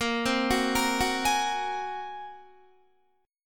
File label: Bb 7th Suspended 2nd Sharp 5th Bb, Guitar; Bb7sus2#5; arpeggiated; JGuitar